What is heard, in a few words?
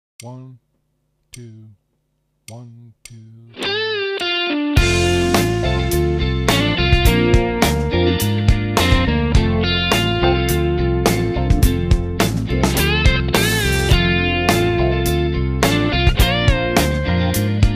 Backing for Rhythm; Play Rock Guitar - Ed Wennink